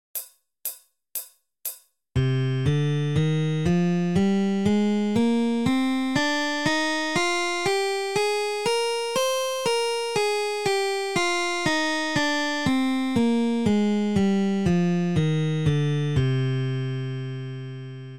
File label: c minor scale excercise, guitar